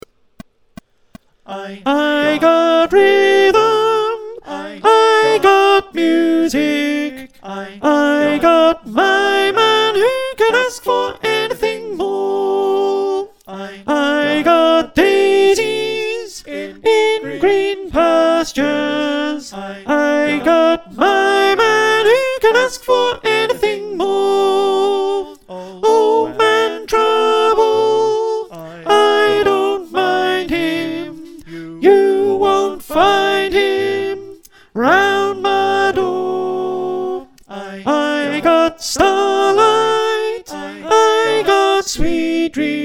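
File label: Soprano, choir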